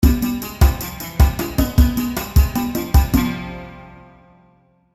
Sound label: Signature Sound; FXHome; Signature Tracks